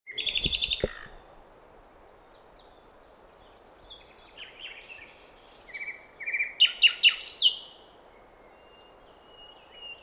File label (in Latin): Luscinia megarhynchos; SETE